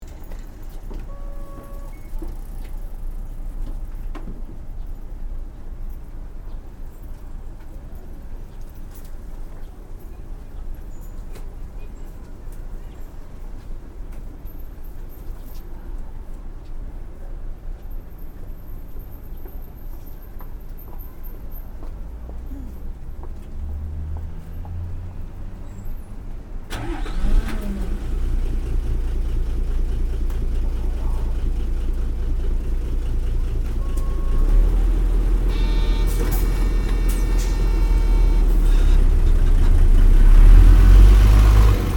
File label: on a bus